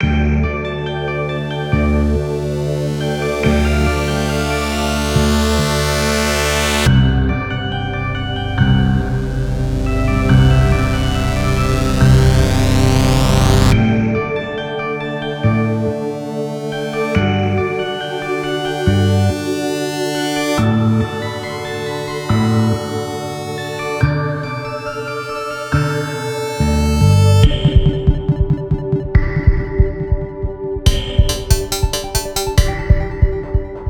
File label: underwater theme